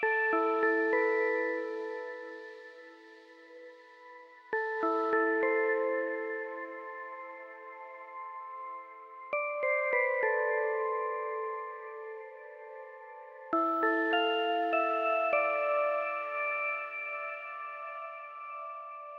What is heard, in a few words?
Melody Keys